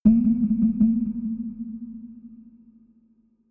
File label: water